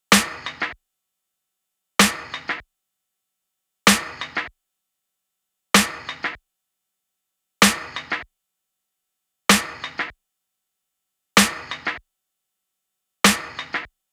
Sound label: Snare